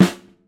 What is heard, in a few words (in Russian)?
marsh udar